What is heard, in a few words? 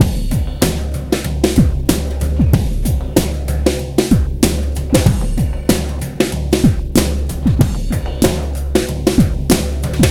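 drum, Breakbeat; DOOM BOOM 095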